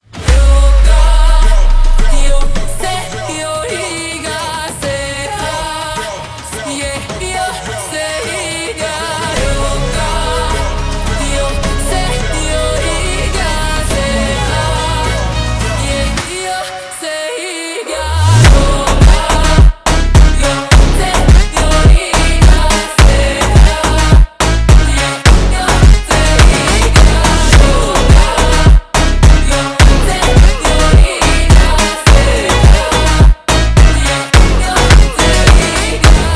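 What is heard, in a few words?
slowed, phonk music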